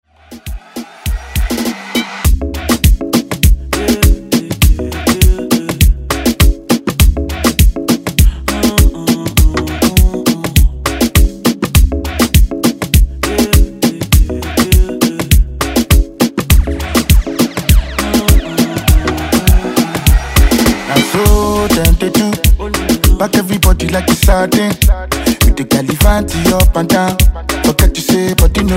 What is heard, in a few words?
Afro Rton Redrum